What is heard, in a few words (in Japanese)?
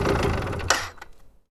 原付のエンジンを切る音; 環境音